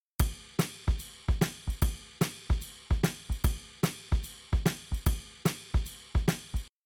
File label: Drums, shuffle drums; WDR2